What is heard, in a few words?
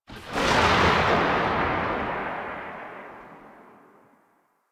Gunbrella Tail, Weapons; Warframe; Tenno; Koumei